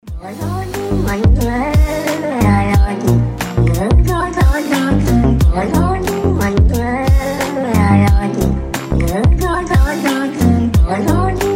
talking cat song

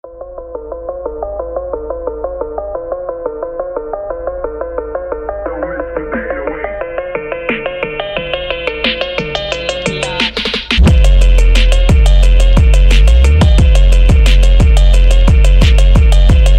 drift phonk cars speed up

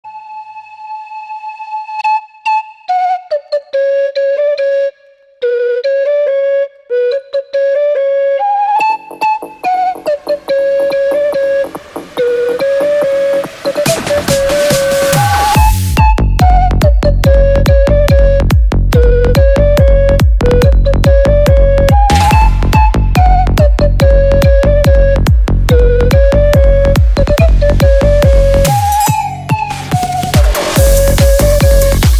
flute

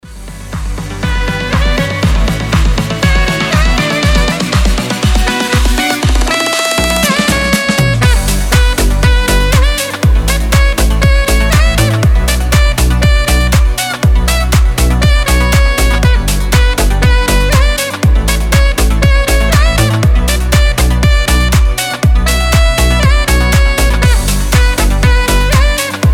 sax

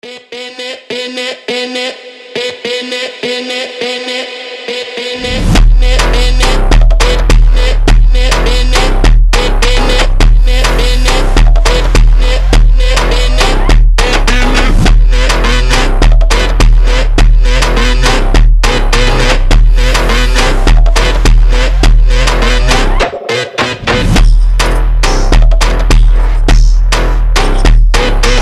brazilian phonk super slowed

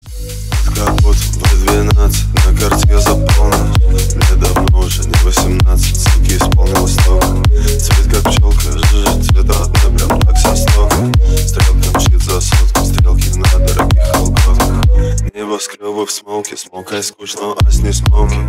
remix

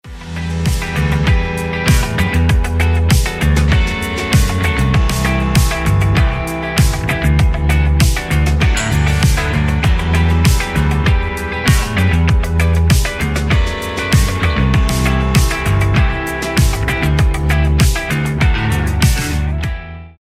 instrumental

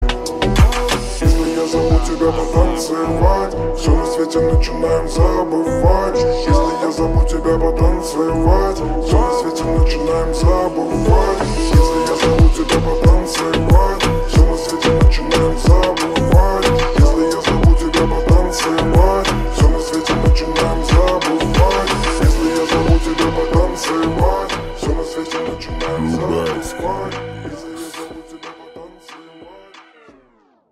slowed

